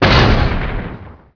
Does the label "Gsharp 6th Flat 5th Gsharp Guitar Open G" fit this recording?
No